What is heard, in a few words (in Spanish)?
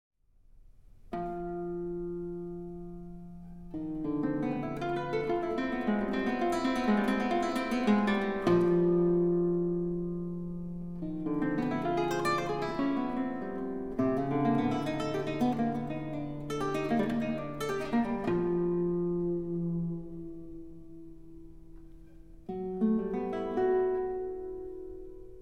Paisaje Cubano con Campanas